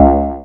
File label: Anasound Short to C, Industrial Analog Single Notes; Sonic Foundry (Sony Creative Software)